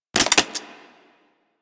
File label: Vomit Gun Mech, Weapons, Infested; Warframe